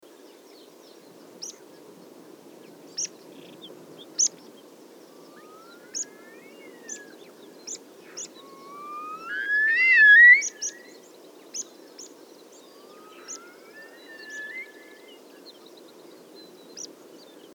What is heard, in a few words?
pip blakistoni; watpip blakistoni; mng bayankhongorijn khukh nuur